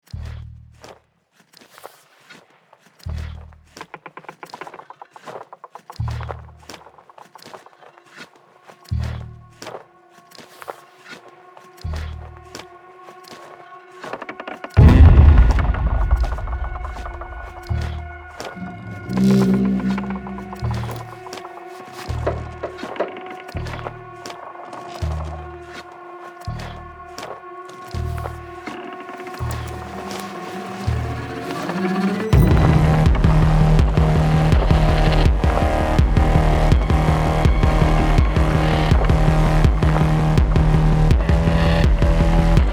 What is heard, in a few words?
HORROR; Leather Experiment